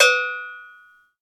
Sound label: Metal Cowbell Low